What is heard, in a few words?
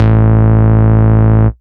Bass Root A